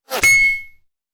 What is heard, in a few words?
Bullet Impact; Sound Ideas; Guns